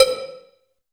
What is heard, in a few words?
FX BELL, PERCUSSION; AKAI S6000 CD-ROM - Volume 5